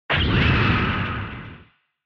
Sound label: Retro Anime Robot Low